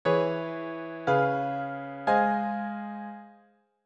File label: VII-V-I